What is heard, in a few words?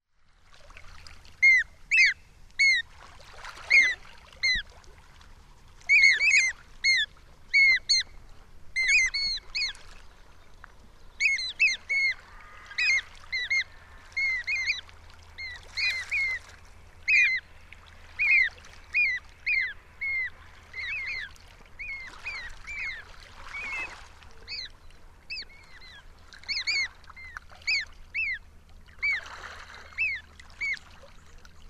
Sooty oystercatcher